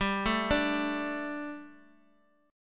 G Suspended 2nd G, down, Guitar, DADGAD

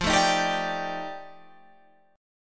F 13th F, chord

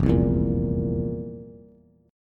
F Major 7th Suspended 4th F, Bass; FM7sus4 strummed; JGuitar

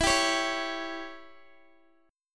E Minor 6th E, Guitar Open D

